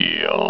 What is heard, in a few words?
ab alert; svencoop; portal house; aberration